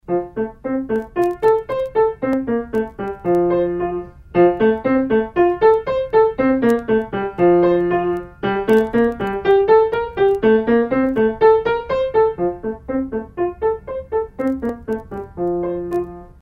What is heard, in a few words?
rain rain, Pianist; Level 1; Bastien